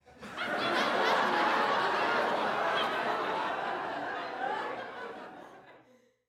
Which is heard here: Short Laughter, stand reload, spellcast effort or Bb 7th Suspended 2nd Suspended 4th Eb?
Short Laughter